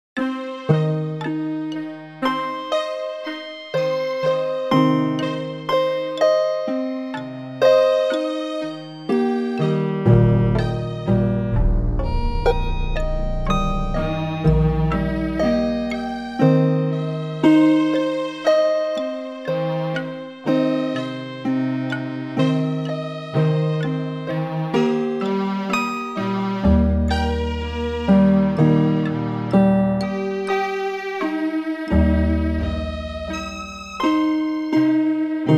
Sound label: Seven equal romp